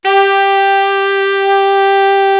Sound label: big sax, M1